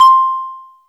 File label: TUNED PERC; Greytsounds VOL-1; LATIN PERC